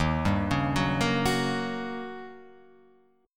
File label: D sharp 7th Sharp 9th Guitar Open F; arpeggiated; JGuitar